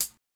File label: hat, Closed Hats